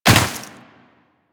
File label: Land Snow, Footsteps; Warframe; Tenno